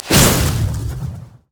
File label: frost scorched earth; LoL; shyvana